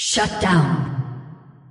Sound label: shutdown